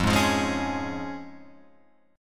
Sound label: F Minor Sharp 5th, Guitar Open F, chord; Fm#5 strummed; JGuitar